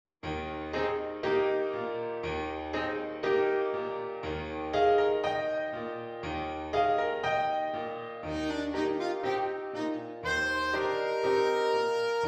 Alto, saxophone; tenor and baritone saxophone

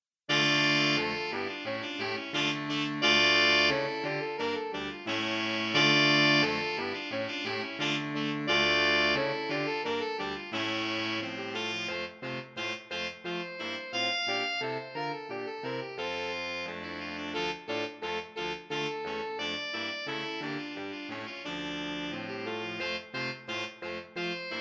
ballo, sax quartet

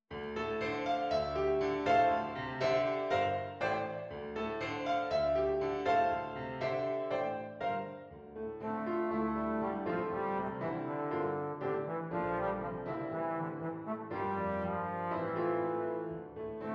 Beautiful Mind trombone; bass clef or bb treble clef

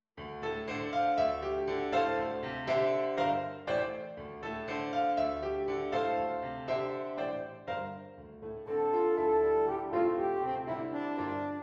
Horn; french horn or eb horn

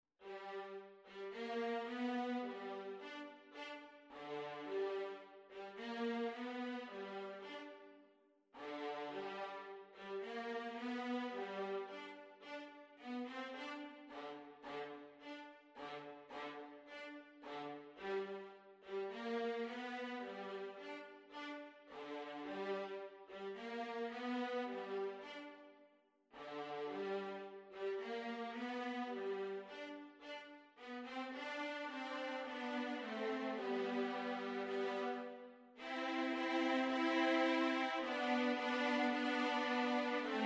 Violas DUET; Vivacious Violas

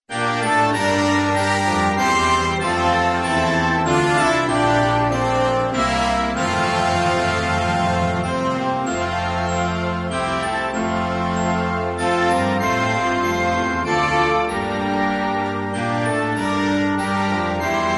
Away In A Manger, concert band

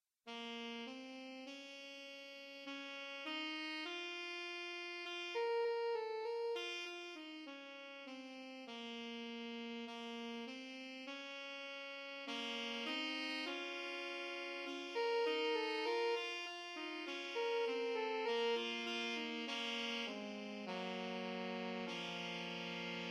sax, sax quartet

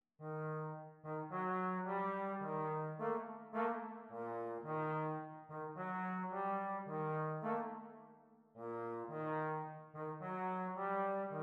Trombones Trio; Tricky Trombones

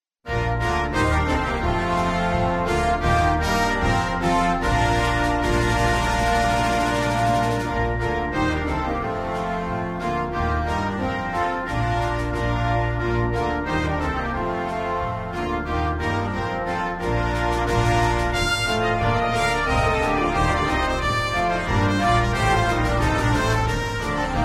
Ding Dong Merrily On High concert band

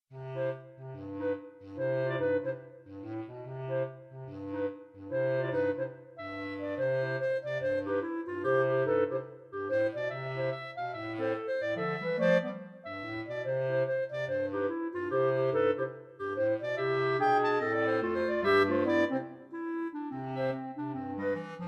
clarinet, jazz clarinet quartet; Turkey in the straw

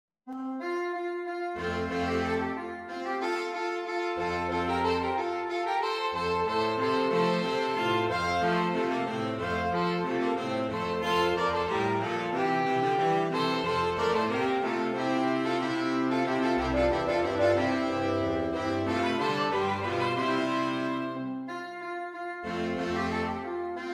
sax, saxophone quartet; la rejouissance; handel

